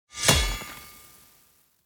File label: Weapon Hit